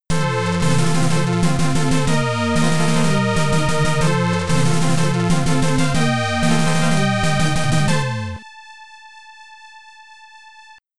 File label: All Clear; P.O.W Prisoners of War; arcade